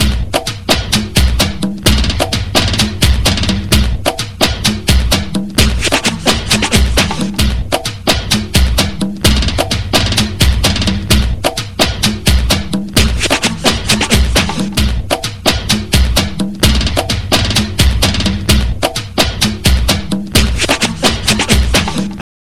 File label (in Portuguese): Tamborzao favela